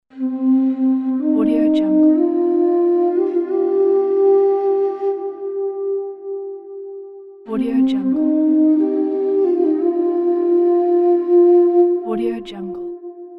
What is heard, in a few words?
soft sad native american flute